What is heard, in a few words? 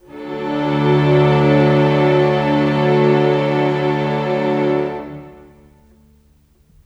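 Pad D major, Orchestral; Sonic Foundry (Sony Creative Software); Orchestral Series VOL-4 - Rock and Pop; Pads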